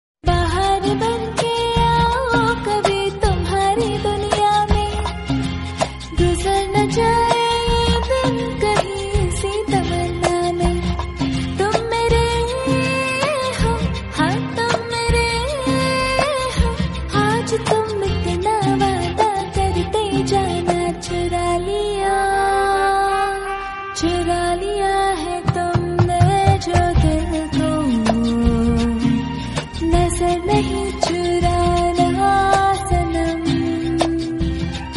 female

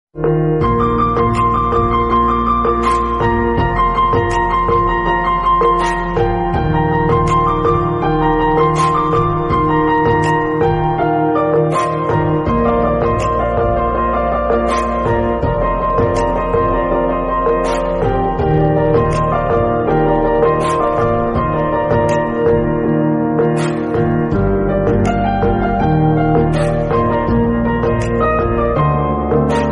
instrumental

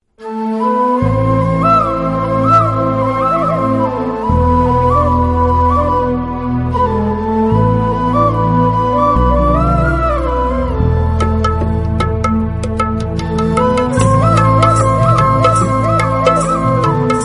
flute

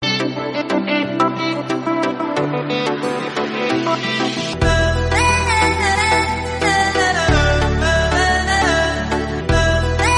instrumental

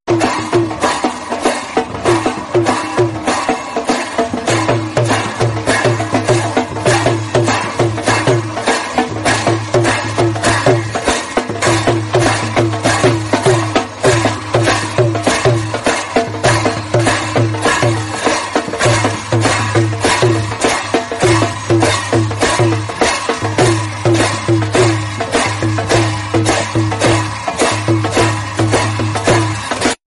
mahakal aarti dhol

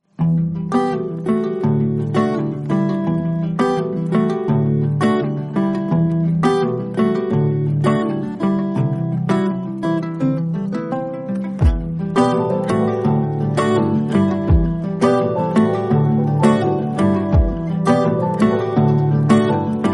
instrumental

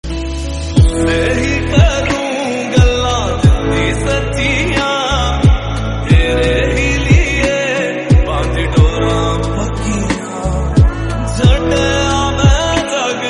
lofi

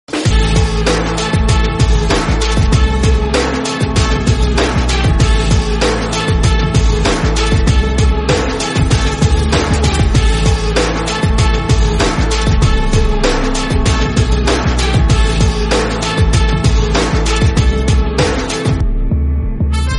instrumental

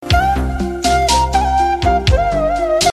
flute

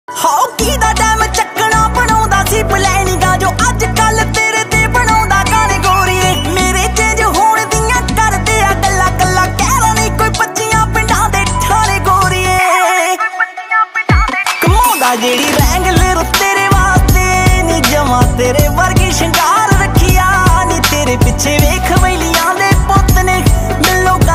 guitar

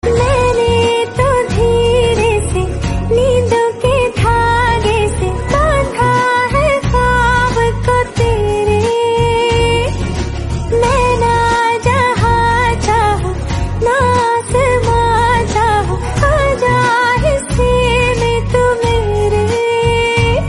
female version

